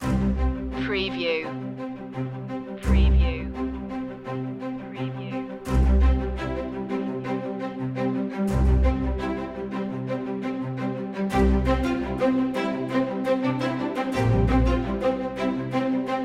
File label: no perc